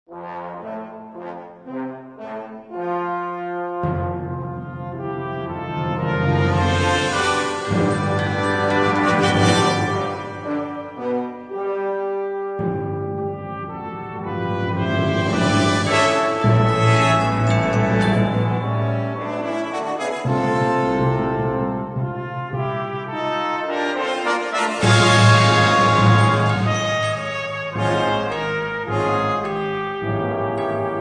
Brass Choir; Rejoice The Lord Is King

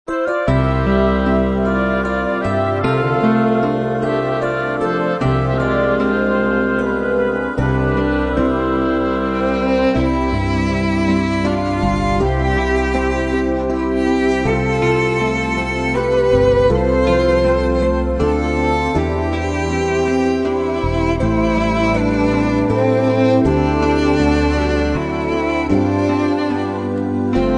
Instrumental Hymns

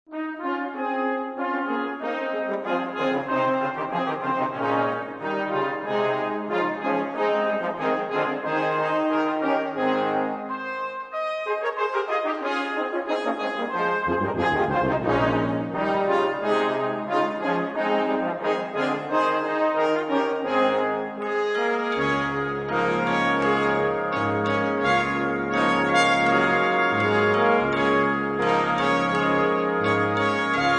brass choir; How Firm A Foundation

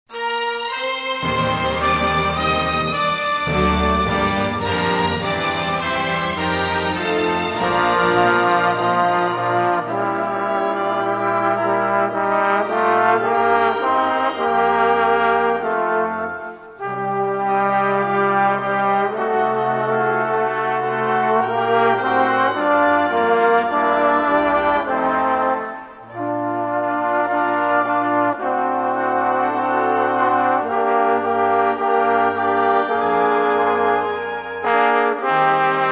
Orchestra, Strings

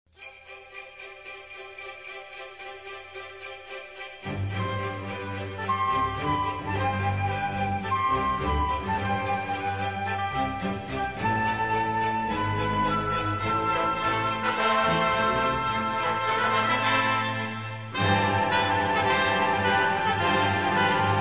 Strings, Christmas

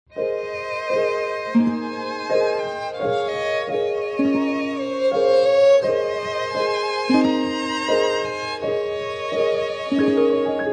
Strings

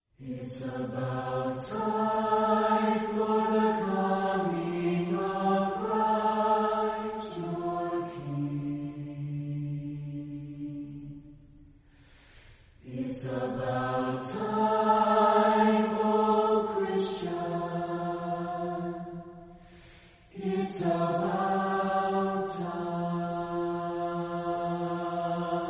Chorale, Voices in Praise acappella

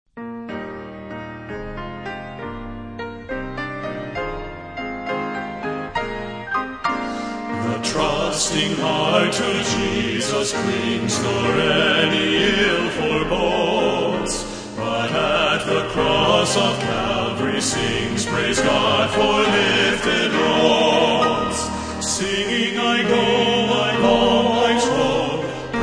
Men's Quartet

